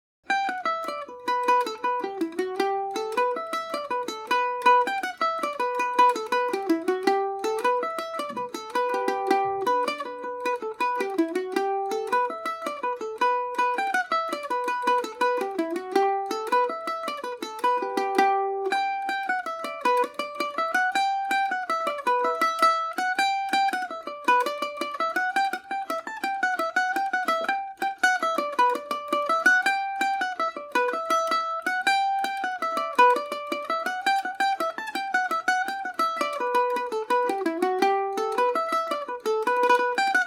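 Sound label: G major paris swing, irish mandolin; Happy To Meet, Sorry To Part; Paris Swing Macaferri mandolin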